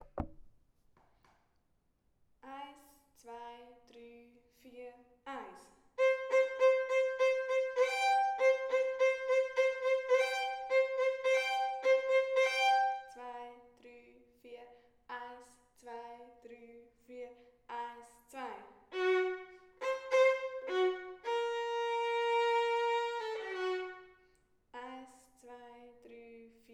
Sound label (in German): Violine; Kinderorchester; Hühner und Hähne; Violine 2